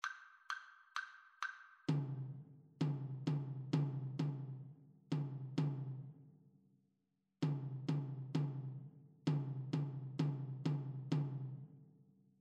rhythmic dictation

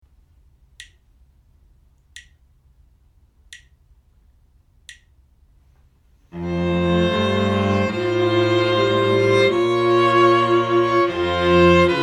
harmonic dictation; ear training

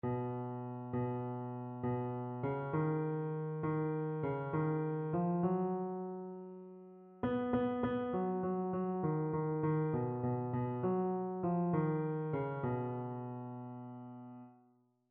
mode identification

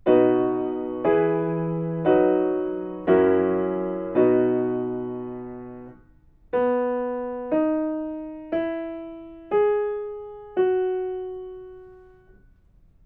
melodic fragments; ear training

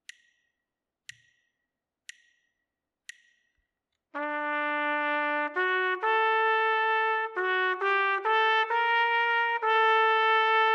melodic dictation; eartraining

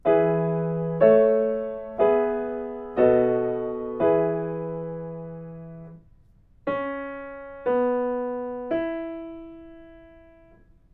melodic fragments; eartraining

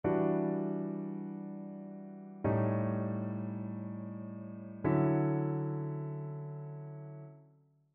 ii V I progressions; eartraining

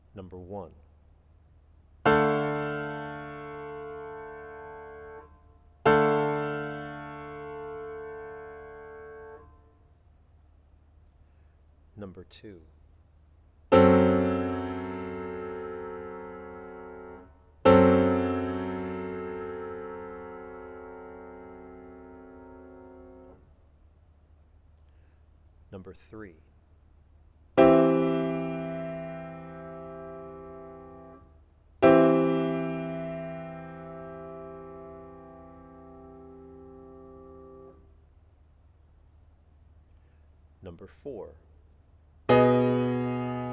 chords; eartraining

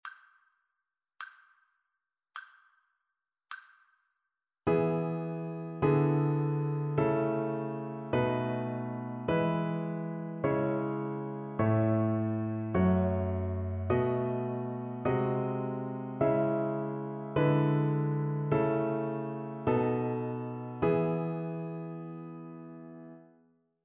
harmonic dictation

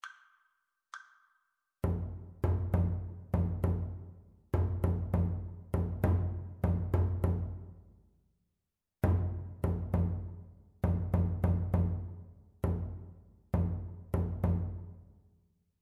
rhythmic dictation; ear training

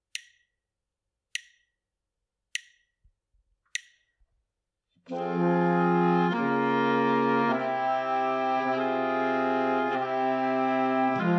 harmonic error detection

